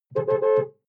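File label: sports car interior horn rhythms short; verkehr-transport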